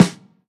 perfect acoustic snare f key; Duration 0:01; Samplerate 44100 Hz; Channels Mono

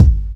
bassy bass drum single hit g sharp key

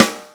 raw acoustic snare sound b key

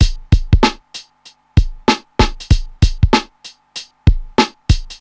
96 bpm drum loop A key

